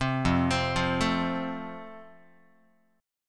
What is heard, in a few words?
F Major, Guitar; arpeggiated